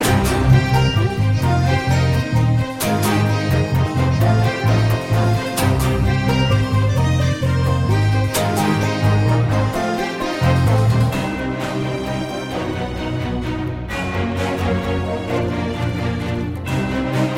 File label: Water Elemental Gate; Skylanders; Swap Force